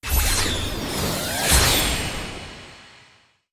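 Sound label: Battle Start Transforme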